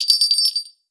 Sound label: Bell; blue archive